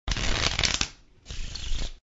virtuoznaya peretasovka

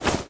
napravlennij na sopernika zamah

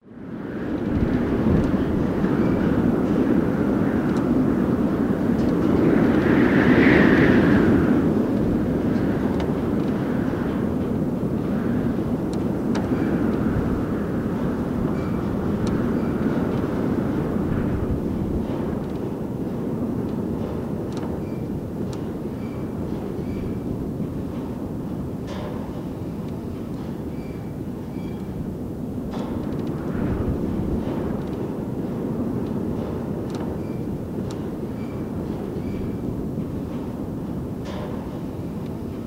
veter v angare

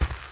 chastij zvonkij korotkij zvuk pomeh televizora

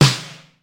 malij baraban odinochnij shumnij

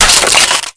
metallicheskij lyazg perezaryazhaemogo oruzhiya

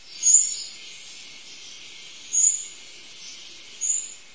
tihoe posvistivanie muholovki

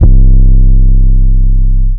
808 c naushniki

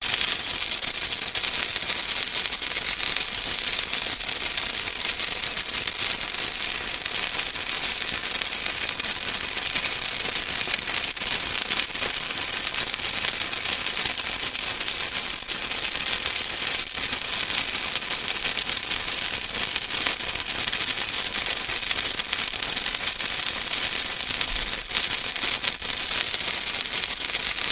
iskryashijsya fejerverk

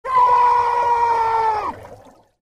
pod vodoj

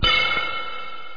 sintezator odinochnij eho dolekij

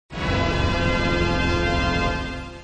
orkestr organ trevozhnij akkord

